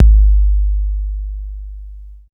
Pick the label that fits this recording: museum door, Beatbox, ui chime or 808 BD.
808 BD